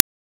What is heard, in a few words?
Concrete Archgun Projectile Empowered Start